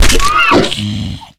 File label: zombie pig death; Minecraft; Conquest Default